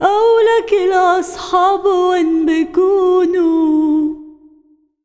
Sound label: SINGER, ArabianTraditions